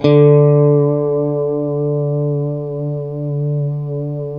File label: D JC120; Guitars; Spectrasonics; Hans Zimmer Guitars VOL-1; JC-STRAT MF